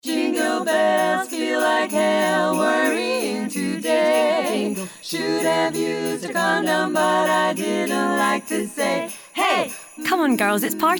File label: Sexual Health Jingle Bells Female; NHS Health Scotland; Spotify